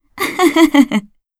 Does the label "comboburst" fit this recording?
Yes